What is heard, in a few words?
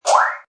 Metal Saw bend; Metallic Sound Sound Effects